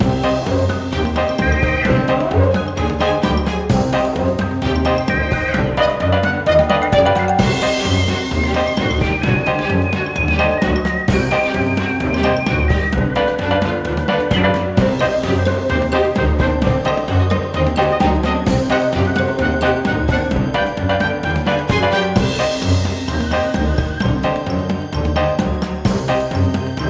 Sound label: Summer Fight 126bpm; Don't Starve Giant Edition; PS Vita